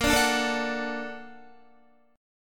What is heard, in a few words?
A sharp Augmented Major 7th A sharp Guitar; A#+M7 strummed; JGuitar